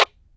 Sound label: Enemy Static; Warframe; Lasrian; Battle Barks